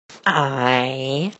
ayy ayy

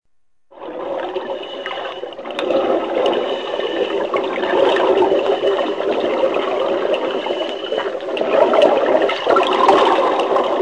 Buceo tanque

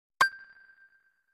notificacion; kingdom hearts

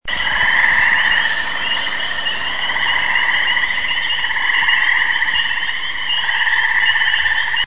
nocturno pantano

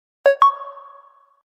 oppo notificacion

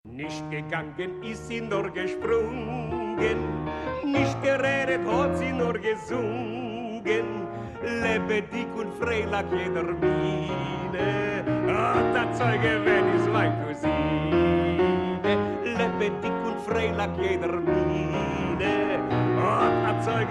bonito tono de piano

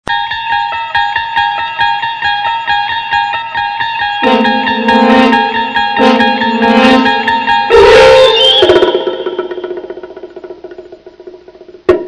psicosis suspenso

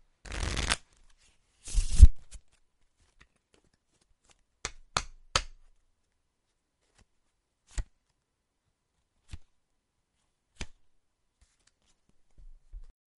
puentes

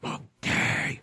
la muerte vocal

